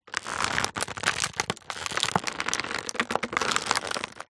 foley plastic thin casing packet squeezes handling creak; zapsplat